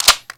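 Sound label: weapon foley mg4 reload close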